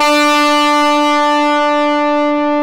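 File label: D2 distortion guitar; AKAI S-Series CD-ROM Sound Library VOL-8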